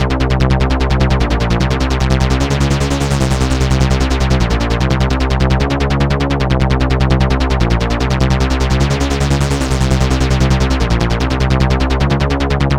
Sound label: Paco Bass, acid trance techno; acid trance techno vol1